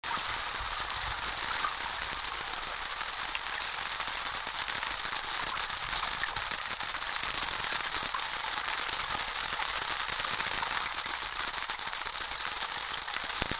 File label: heavy loop